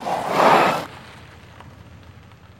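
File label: Cadillac Gravel Skid To Stop; Cadillac 1972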